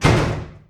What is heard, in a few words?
metal blast steady; Apotheorasis